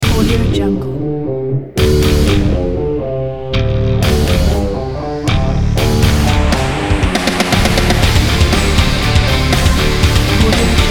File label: sport action